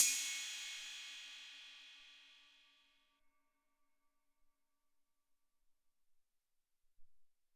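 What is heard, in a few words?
Tek Cymbal, Alesis SR-18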